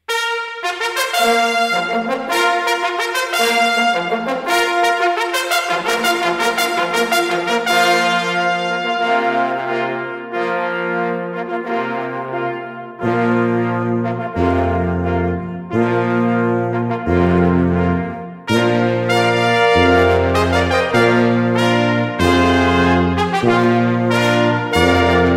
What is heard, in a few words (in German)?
Fanfare